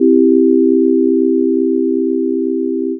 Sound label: c major pure